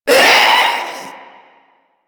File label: Proto Infested Vocal Short Attack, Enemies; Warframe; InfestedNinetyNine